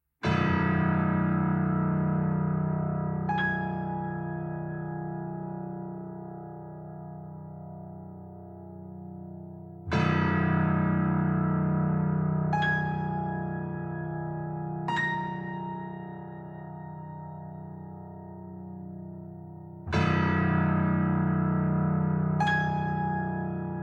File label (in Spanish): piezas contrastantes para piano; Tres piezas contrastantes para piano 2